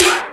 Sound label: TECHNO HIT; E-MU Producer Series Vol. 2 – More Studio Essentials; Composer; Technopourri